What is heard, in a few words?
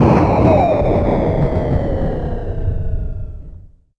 mortar, weapons